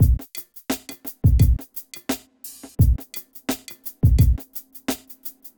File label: r and b